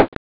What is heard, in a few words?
BANG